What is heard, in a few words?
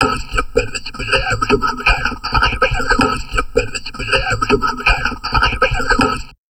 TALK, Drum Loops Crazy Processed